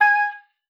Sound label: OBOE2, G#5; Xsample VOL-6